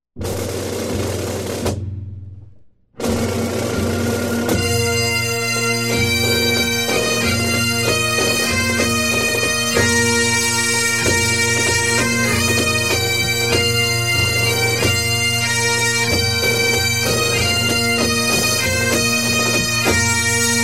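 Bag pipes Highland Pipes and Drums Bagpipe Music of Scotland; Classical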